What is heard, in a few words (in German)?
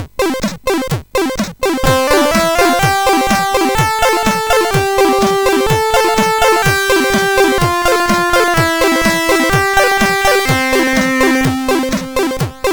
Alle Meine Entchen Major, MOS6581R4; hvsc